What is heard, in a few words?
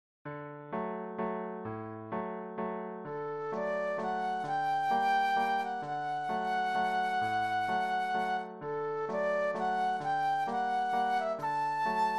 Flute Solo; classical